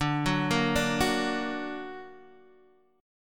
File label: D Augmented D down Guitar Half Step Down